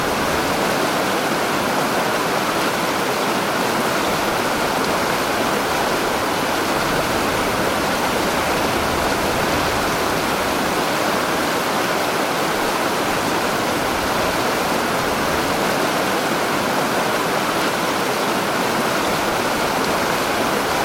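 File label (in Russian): мощное журчание воды впадающей в море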